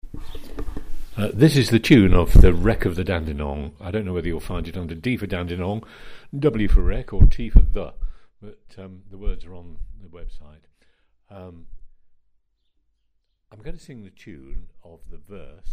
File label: Tune; Wreck of the Dandenong